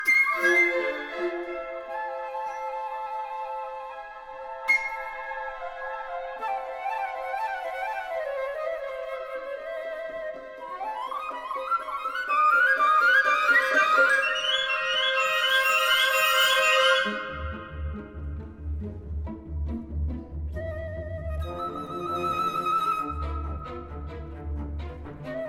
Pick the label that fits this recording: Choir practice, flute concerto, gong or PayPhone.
flute concerto